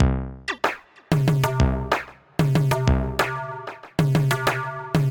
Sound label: 80 Variation; Drum Machines; Trax Trouserpants; Ableton